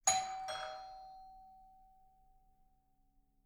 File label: Doorbell Ringing Distant Perspective; Foley